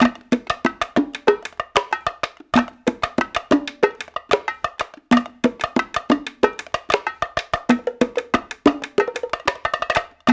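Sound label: 93 bpm, bongo tonga; Tongatown; Spectrasonics; Supreme Beats; African Contemporary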